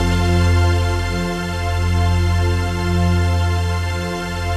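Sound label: chord pad, Pads; Best Service; XX Large - Pads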